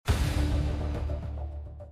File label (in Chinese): 坏人出场